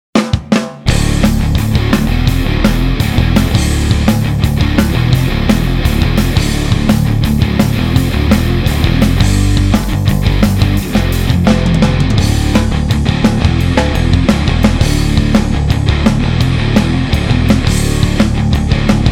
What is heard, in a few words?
Sound Check Drop D